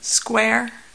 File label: square; shapes; Phaser2D